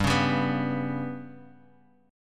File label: G Major Flat 5th, Guitar, Half Step Down